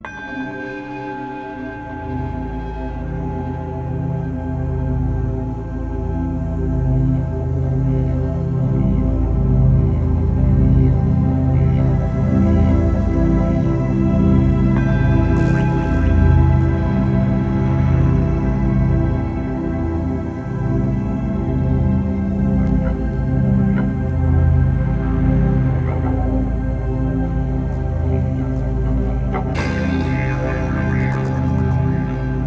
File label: Tibet Trance Loop; Scrabble; Scrabble Interactive; Wii